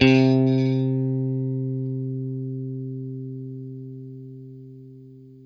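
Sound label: Stratocaster, Guitar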